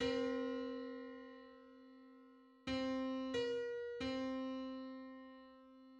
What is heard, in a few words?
Nine-hundred-thirty-fifth harmonic on C